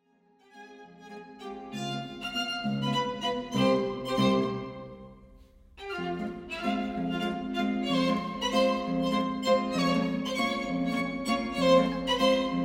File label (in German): Walzer, schrammelgeigen; Pester Walzer